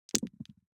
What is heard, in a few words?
DICE on Felt, Throw and Roll, Standard, 5 Five Dice; Articulated Sounds